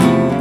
Guitar; ClubFusion; Mexicana Chillitime

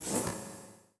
button Swivel; World Masters Pro; Dart Sounds

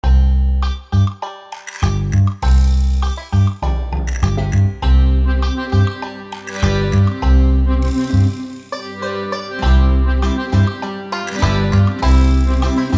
Background; OverTheBrink

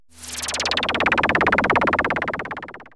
Planet Whoosh; CosmicCrazyCash; ClubFusion

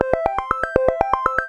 Vibe Win Transfer; JackpotDarts; ClubFusion

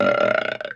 Burp; MexicanaChillitime

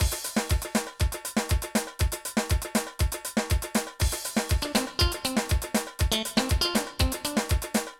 Gamble Loop; MexicanaChillitime; ClubFusion

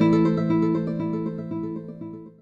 Just Reel Spin Short; MexicanaChillitime; ClubFusion

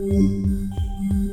Music Loop Short; Twingo; ClubFusion

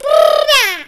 Drrrr Dah; MexicanaChillitime

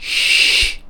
shhhh; PubQuizKnockout

